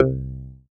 Multimedia Internet CD-Rom Flash Beep Mechanical

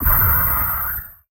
Multimedia Internet CD-Rom Flash Wipe

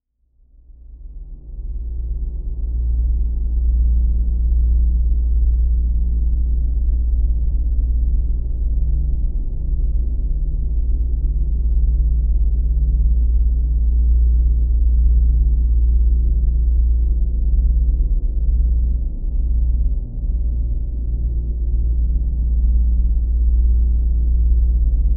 Drone Ominous Dark Deep Rumble; Production Element Imaging; AdobeCC

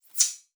Multimedia Internet CD-Rom Flash Click Mechanical

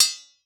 Sword Hit Metal, weapons; AdobeCC

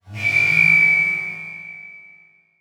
Transition Whoosh Medium; Production Element

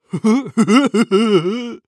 Cartoon Male Dumb Laugh; AdobeCC

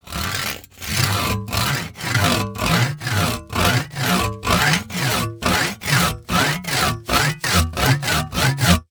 Industry Tool Saw Triangle Saw Sawing Wood

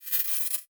Title Transition Digital Readout; Adobe; Production Element

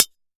Weapon Knife Hit Short; AdobeCC

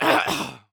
Human Male Clears Throat

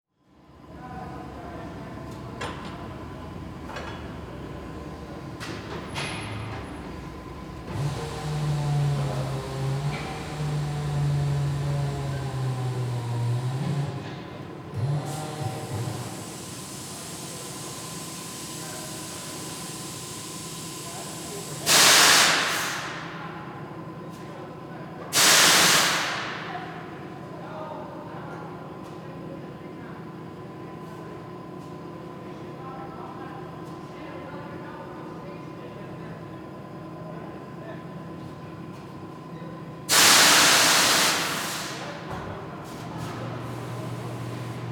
Ambience Auto Repair Shop; AdobeCC

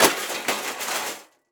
Crash DVD Player Dropping On Pavement; crashes; AdobeCC

